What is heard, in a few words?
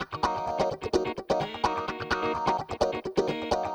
Electric Guitar - D# min, 128BPM; Vengeance Samples; VEH3 Electric Guitar Kit 2